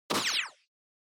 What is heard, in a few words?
Motion Agility Mid